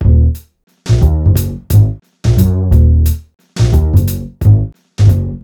Funky Upright, Upright Bass

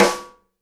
SNARE RIM, Live Snares

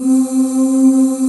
PAN CHOIR; STRING+CHOIR; Pro-Rec; Synthsonic

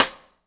sidesticks; Spectrasonics - Backbeat

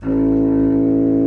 BASSOON A1; Xsample VOL-6; C BASSOON

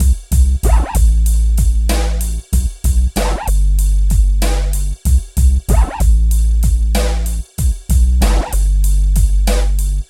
PHATT BEA, Hip Hop

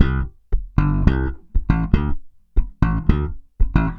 SM BS C, BASS SAMBA

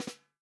FUNK SNARE CHROMATIC, REAL DRUMS; Best Service ProSamples vol.43 - Real Drum Kits

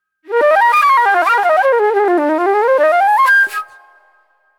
NY 70's Jazz Flute

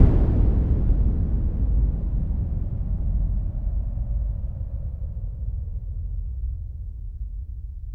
BOMB, ANALOGICS; Back In Time Records - Big Bang

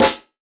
One Shot Snare, Drum N Bass - Percussion; Sonic Foundry (Sony Creative Software); Knutrix Drum & Bass

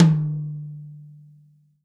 tom, Real Drum Kit, funk kit

